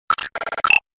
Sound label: glitch